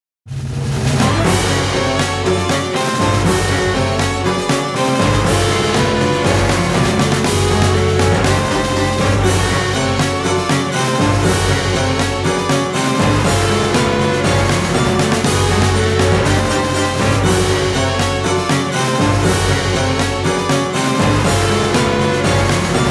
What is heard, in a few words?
National Anthem; Advance Wars 1+2 Re-Boot Camp; Black Hole